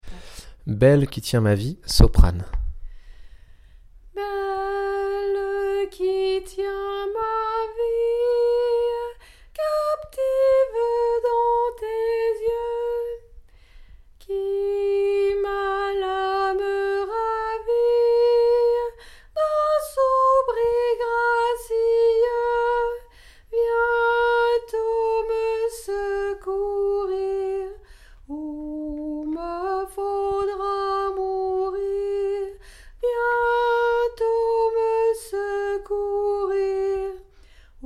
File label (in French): soprane; Belle qui tiens ma vie